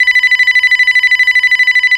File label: phone